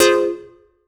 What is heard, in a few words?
stab hard, stabs